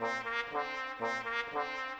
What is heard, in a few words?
Brass; Orchestral; Sonic Foundry (Sony Creative Software); Orchestral VOL-2; Modern